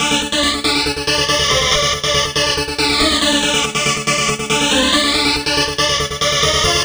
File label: MEL 140; Sounds Good - Eurotech